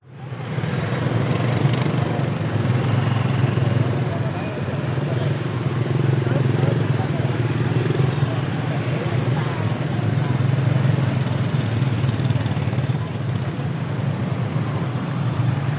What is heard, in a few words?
city da nang vietnam traffic motorcycles people